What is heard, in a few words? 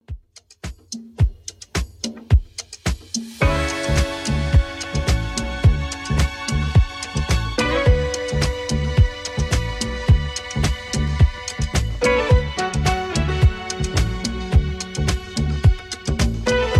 70s Redrum 108bpm